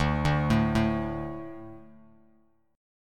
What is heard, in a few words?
D 5th D, down, Bass Standard